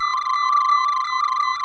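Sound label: tricorder scan, technology federation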